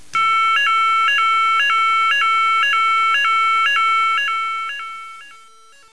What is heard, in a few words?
Alternating 554-440 Hz (100ms - 400ms)